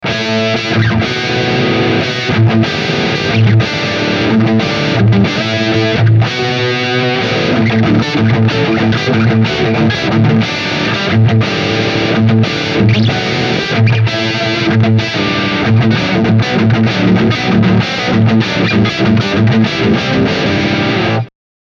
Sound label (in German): marshall plexi plugin rock rhythm dyn. mikro